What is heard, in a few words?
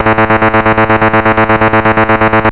Pitfall Trem A1; Ableton Foundation FreePacks; Foundation Glitch Toys & LoFi